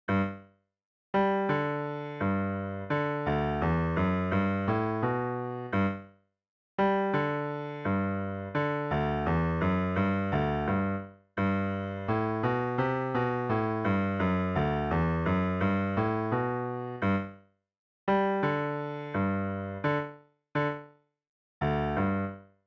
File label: Duet; Op Een Grote